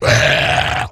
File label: scream; Deerclops